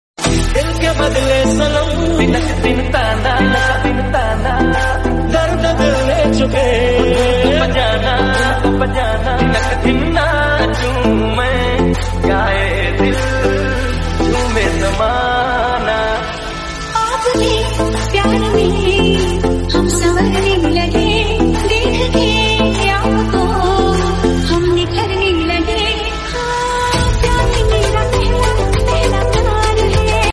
Romantic Hindi Ringtone

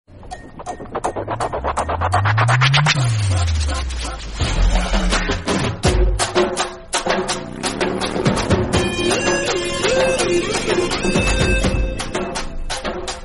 Tamil South Indian

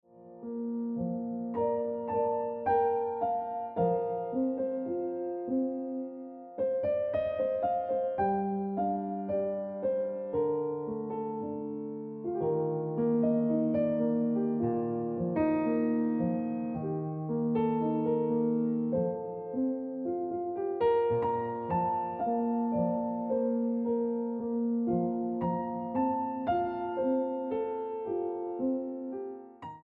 Ringtone Piano Music

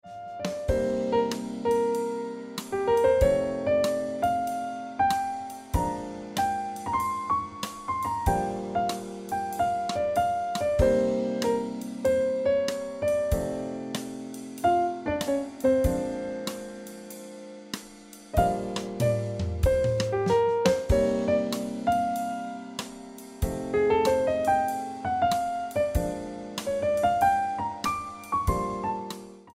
Ringtone Piano Music

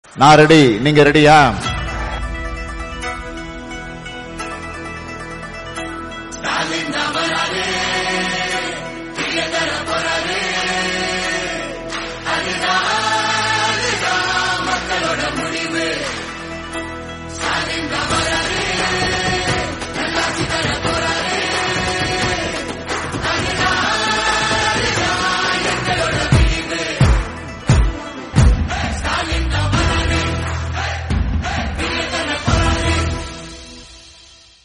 Song South Indian

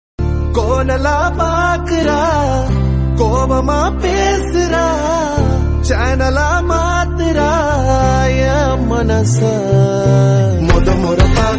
Tamil South Indian

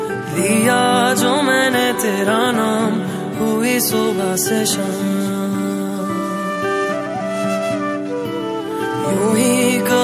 Male Version Hindi

